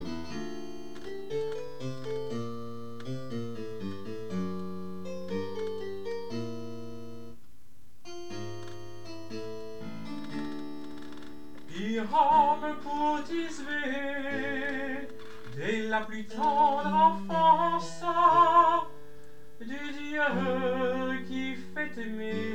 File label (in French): baryton central